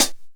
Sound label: Yamaha RX15 Closed Hi Hat